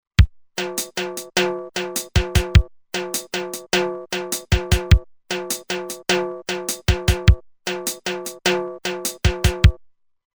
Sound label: Reggae Fill In, Roland TR-505; Factory Rhythms